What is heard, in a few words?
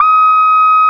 QUAC, QUACK SOLO, Synth; InVision Interactive; Keith Emerson; The Most Dangerous Synth and Organ